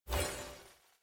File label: win